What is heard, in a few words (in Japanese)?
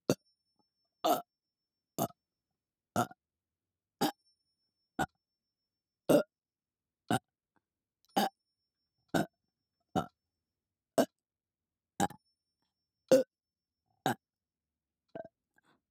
右耳4拍子「あ」のゲップメトロノーム